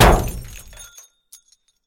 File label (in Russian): gluhoj tyazhelij zvuk